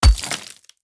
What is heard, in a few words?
axe mining ore; Factorio